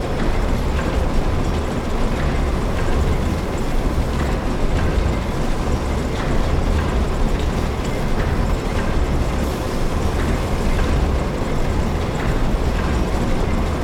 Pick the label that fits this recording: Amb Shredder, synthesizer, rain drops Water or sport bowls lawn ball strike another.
Amb Shredder